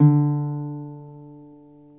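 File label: reference pitch uke bari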